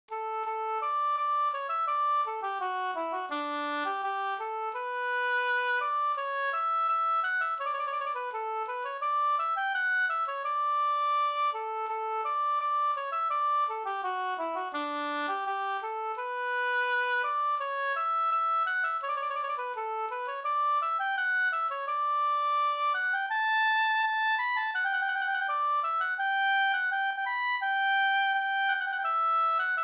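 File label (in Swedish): brudmarsch Klovsjo brudmarsch Jämtland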